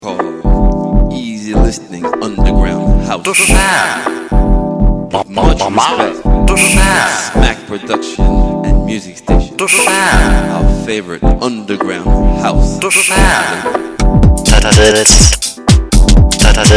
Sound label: sound groove